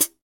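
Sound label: hi-hat, hi-hat real; Sound & Vision - Gigapack I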